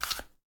crunch

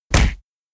card punch

